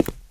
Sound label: tile, footsteps walk; Left 4 Dead 2